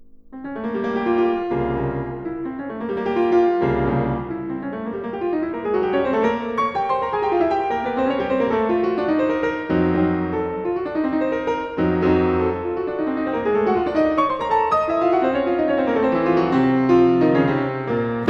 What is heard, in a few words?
Prelude in F minor, Piano; Chopin; Preludes